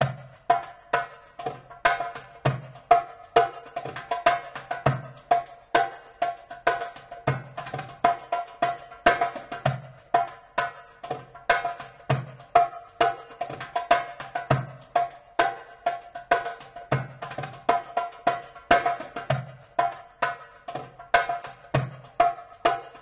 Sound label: Dumbek